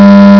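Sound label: buzz sine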